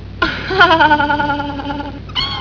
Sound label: baby laugh